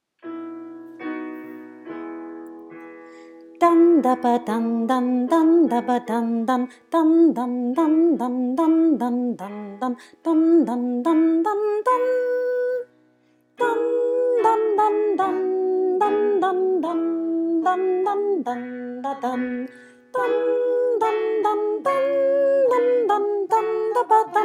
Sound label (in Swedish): militärmarsch sopranos; schubert